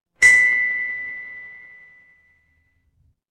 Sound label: instrument chime bar